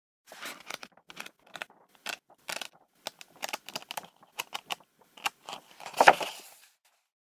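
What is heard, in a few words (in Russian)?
прощелкивают и закрывают обложку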